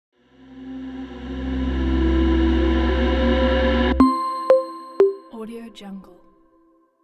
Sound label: technology sting logo; catdaowl